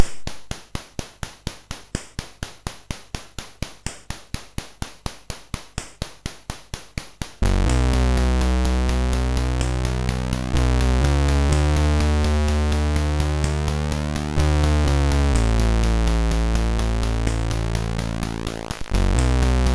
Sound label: tune, MOS6581R3